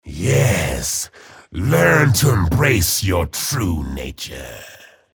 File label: hellknight pain ability damage; low health